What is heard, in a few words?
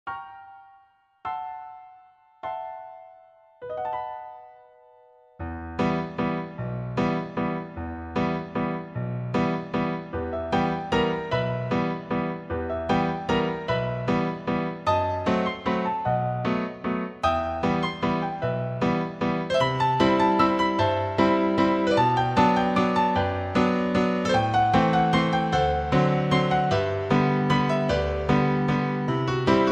Piano; Dream Theme; Mysterious Girlfriend X